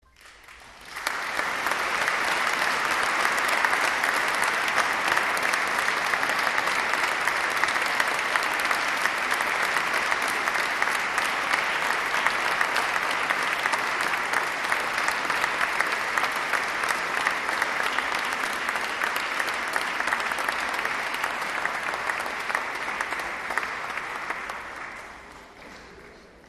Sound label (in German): Applaus; fuer das Junge Ensemble; Konzertmitschnitt vom 20. Dezember 2016